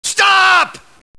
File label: police alert; svencoop